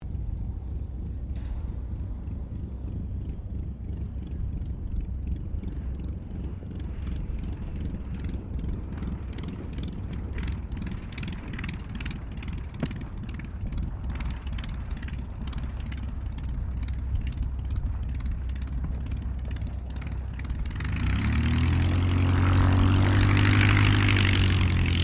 AIRCRAFT DH82A DEHAVILAND TIGERMOTH LANDING; RAAF Tigermoth; Airplanes; Vehicles

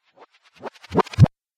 Dance Riser

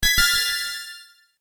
UI Back, User interface feedback sounds; Ui Set B; Computers; Electronics

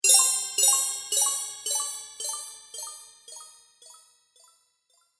Fairy Sparkle Delay, magic; fiction sound effects

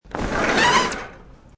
Slide Dr Opn, Sliding door opens.; Sliding door opens slowly; Part of Sliding Doors (Sound Effects:Doors)

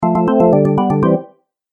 Organ Game Music; Cartoon Music Sound Effects

